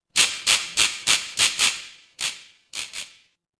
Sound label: fire electric spark